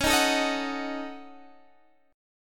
Gb Minor 6th Db

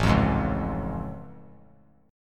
A Augmented Major 7th A; strummed; JGuitar

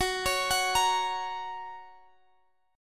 F sharp Major, guitar

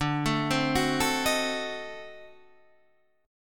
D 7th Flat 9th D down, chord; D7b9 arpeggiated; JGuitar

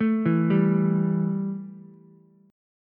E Minor Double Flat 5th Ukulele; arpeggiated; 2,4,3,x; JGuitar

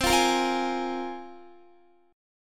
C 7th Suspended 4th Sharp 5th, Guitar

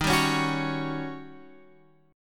D sharp 7th Sharp 9th D sharp Guitar Open F; D#7#9; strummed; x,10,7,8,9,8; chord shape

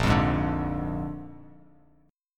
Bb 7th Bb down, chord; strummed; JGuitar

